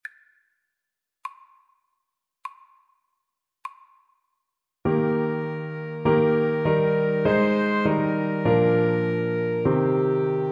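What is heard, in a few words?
Alto Saxophone Duet, karaoke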